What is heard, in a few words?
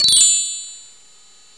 Troid Pop Out; Safari